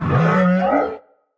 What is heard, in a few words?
monster roar long; soundscrate